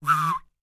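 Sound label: WHISTLE, ANDES; World Voices; ANDES FX; Spectrasonics Vocal Planet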